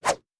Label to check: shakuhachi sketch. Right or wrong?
Wrong